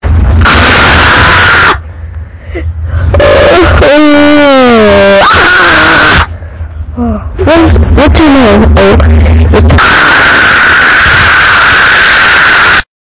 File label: scream